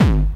kick hardcore, drums; lmms

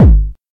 kick distorted; lmms; drums

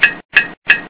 pickaxe; mining; gcompris-qt

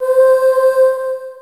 chorus; lmms

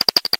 sharpen; tuxpaint; magic

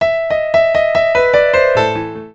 piano; primartis; sonidos

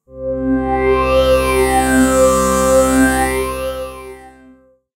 space strings; lmms

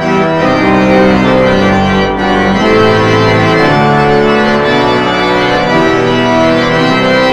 organ; gcompris-qt

